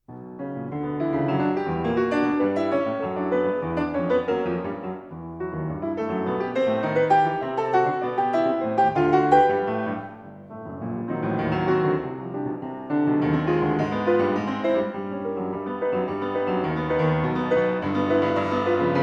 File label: Preludes for Piano Allegro in B Minor